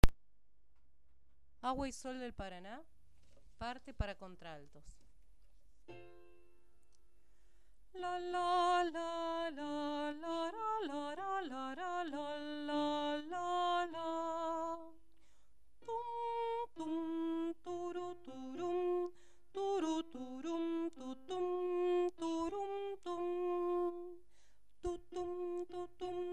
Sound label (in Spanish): Contraltos